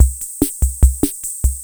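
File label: ROCK, drum machine; Crypton - DrumBase5000; TR-66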